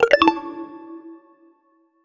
fancy sleek modern

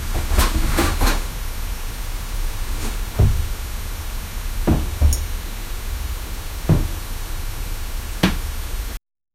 wet sloppy noises echoes, room tone

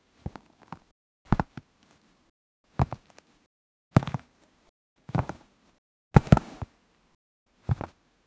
a quick close up sound ef, footwear

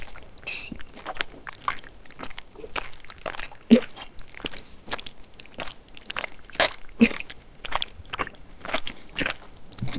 realistic sounds of a bab, snorts

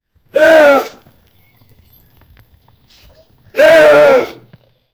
strong and energetic horse, horses

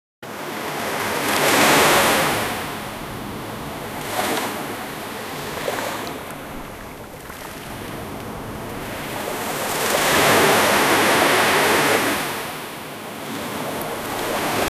the soothing sound of wav, beach

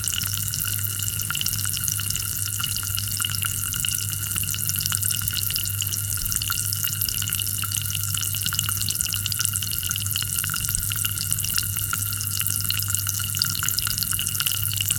liquid acid sizzling and, chemical

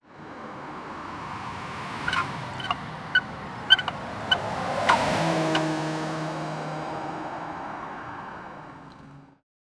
car tyres screeching; road

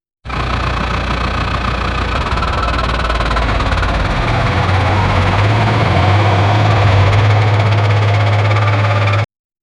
truck starting sound

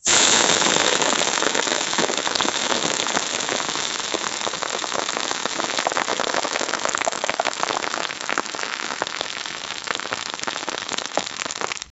gurgling and bubbling hot, volcano

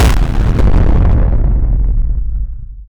fast explosion

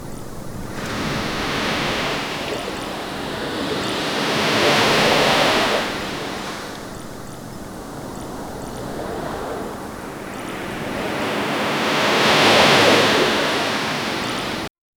the sound of calm sea; beach

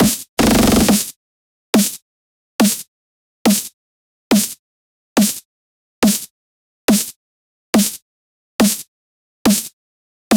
brostep snare drum

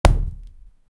human hitting hard concre; accident

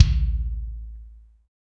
punchy kick drum from 192, 1920s

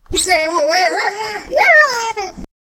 intro sound; revealing

